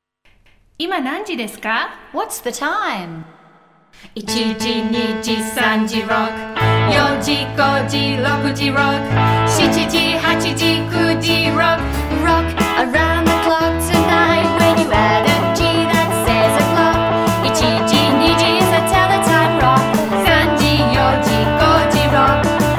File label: Tell the time rock with vocals